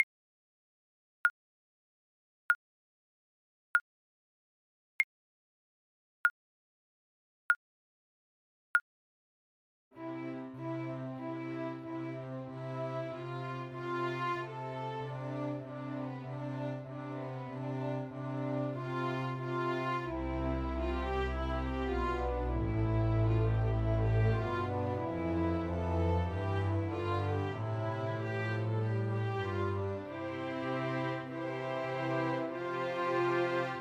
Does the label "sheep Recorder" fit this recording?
Yes